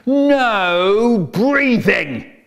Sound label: no breathing